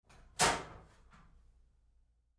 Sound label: Door Locker Open Roomy Lite, Metal Door; Metal Doors